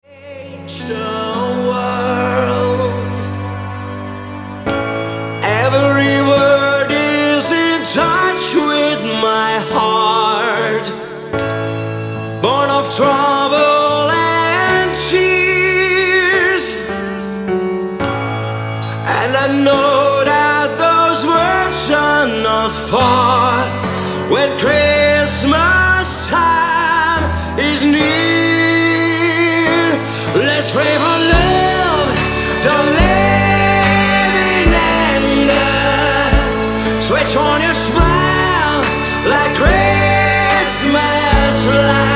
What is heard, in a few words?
Acoustic Version